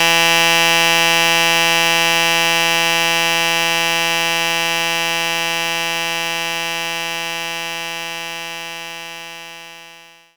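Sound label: Guitar Click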